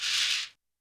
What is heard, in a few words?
Shekere, YAMAHA RM1X